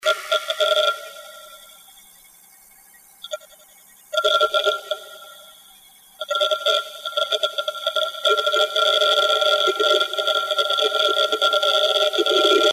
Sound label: raptor 74 activity, hdd noise; seagate barracuda 400GB